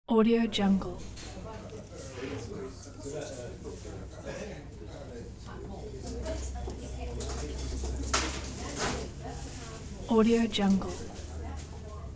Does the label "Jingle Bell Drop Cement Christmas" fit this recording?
No